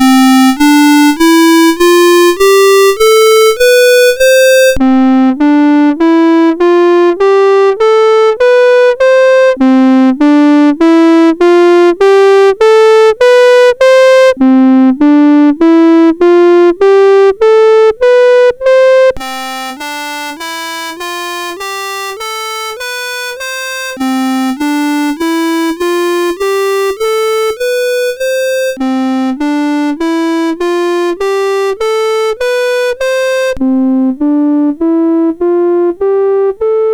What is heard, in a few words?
Filter Mode Demonstration, MOS6581